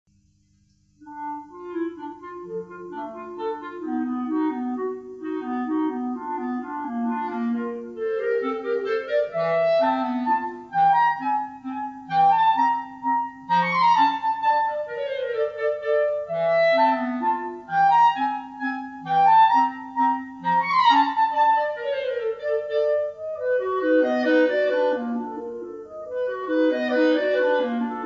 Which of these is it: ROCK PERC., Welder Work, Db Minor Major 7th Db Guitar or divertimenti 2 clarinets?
divertimenti 2 clarinets